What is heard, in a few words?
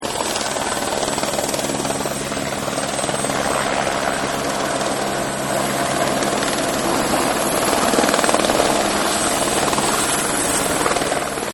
Helicopter; Helicopters; Vehicles; Partners In Rhyme